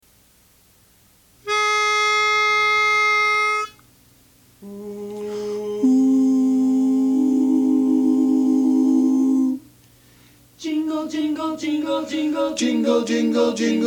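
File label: Jingle Bells, Bari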